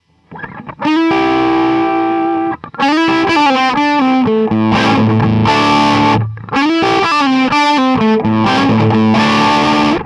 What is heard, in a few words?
dimed bassman